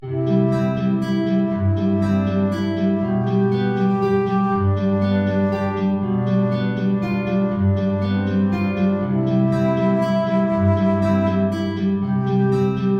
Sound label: sweetly the bells are ringing